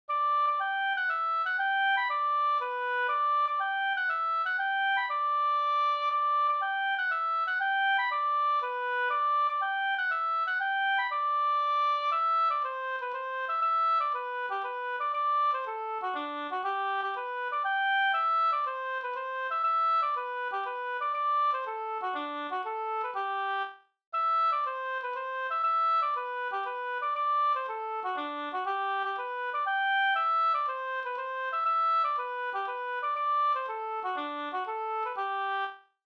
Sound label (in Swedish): polska